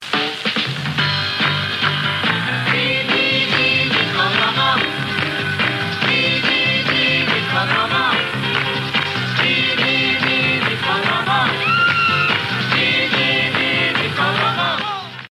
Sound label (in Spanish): Cortina completa